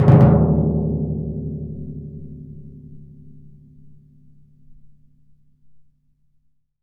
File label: TMP, Synclavier Percussion; ILIO; Synclavier Percussion 2; World & Orchestral